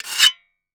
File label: Metal Scrape Short; foley